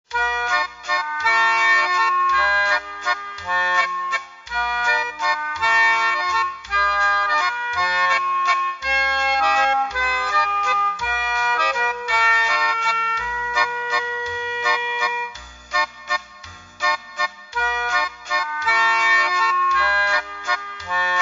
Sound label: Accordion Music; Rabbids Go Home